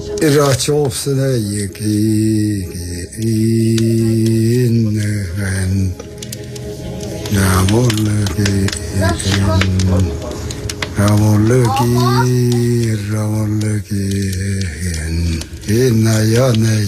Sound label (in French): Chant nenetse